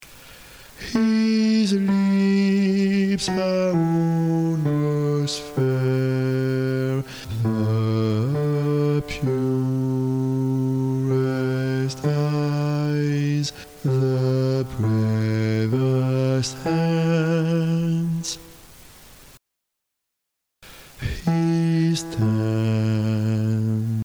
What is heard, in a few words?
basse; black is the colour